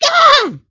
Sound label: Stand leg poke